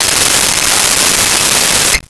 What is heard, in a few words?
Cell Phone Ring; Communications